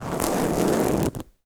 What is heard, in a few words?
nails on velvet single, Fingernail Scratches; Multi-Surface; 3maze